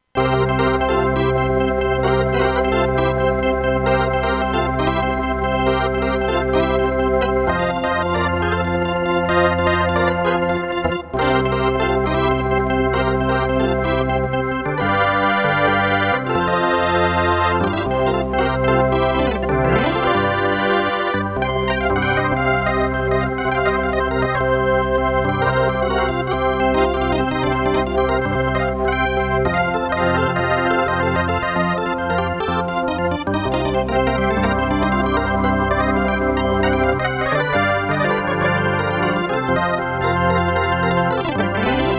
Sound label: Full Draw B3